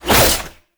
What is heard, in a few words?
Whoosh, Melee; Warframe; 1999 Skana